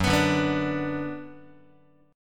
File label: Gb Suspended 4th Sharp 5th Gb, down, Guitar; strummed; JGuitar